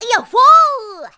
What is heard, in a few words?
Jump; Zelda